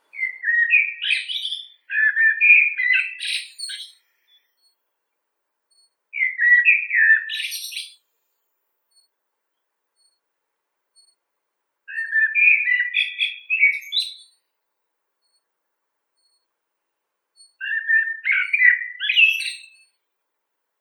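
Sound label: blackbird, Turdus merula; drozd chernii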